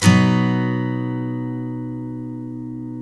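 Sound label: steel B major up, CHORDS STEEL, Guitars; Spectrasonics; Hans Zimmer Guitars VOL-1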